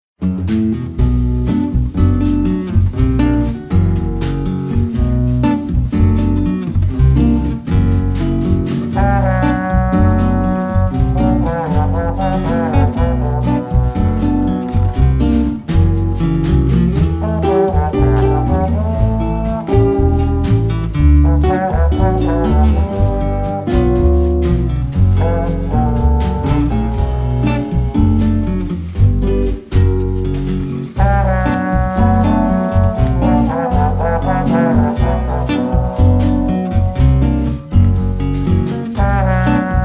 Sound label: escapade trombone version